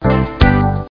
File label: village hall; FirstClass; VillageSettings 3.80